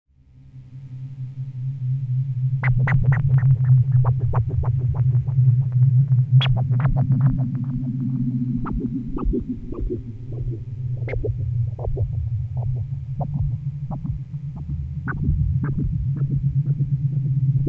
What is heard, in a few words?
Boings, modularsynthesis